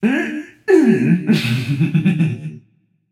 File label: tongue, voicepack; smite; jorm